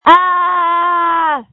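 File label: Scream, phone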